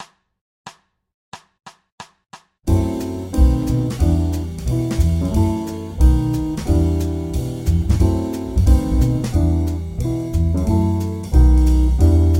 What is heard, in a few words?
F#m7b5-B7-Em7 loop; 2bars Loop